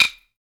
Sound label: Sticks; SB Sticks